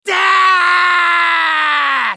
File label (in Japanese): 攻撃長; パンファルロ